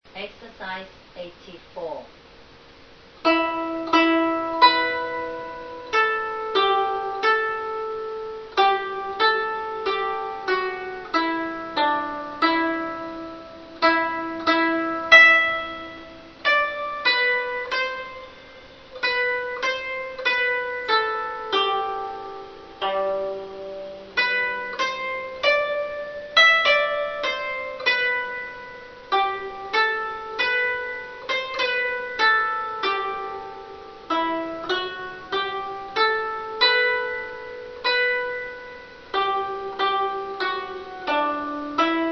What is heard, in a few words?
zheng